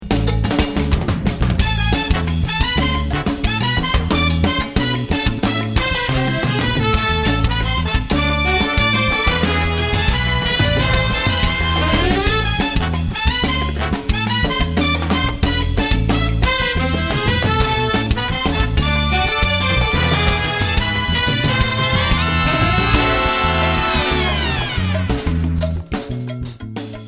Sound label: Salsa